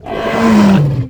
pinky die, monsters vocalizations; DoomRTX

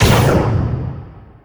flyer shot, ambience; Quake 4 Alpha; id software

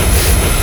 turret, vehicles speeder; Quake 4 Alpha; id software

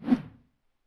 Imp Attack Whoosh, imp fireball; Doom

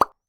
pop

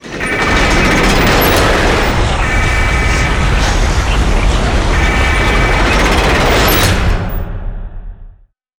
lava trap, machines; Quake 4 Alpha

